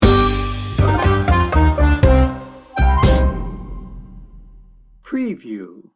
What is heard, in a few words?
tango mischief sting bumper